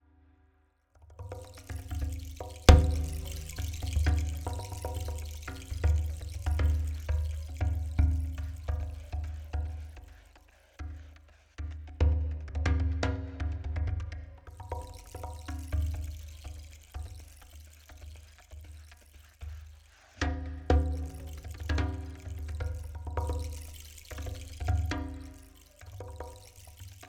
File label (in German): Wellentrommel Noise